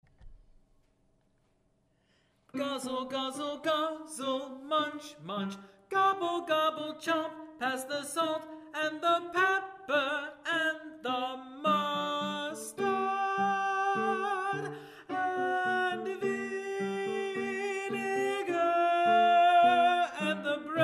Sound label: Alto; Banquet Fugue, J. Rutter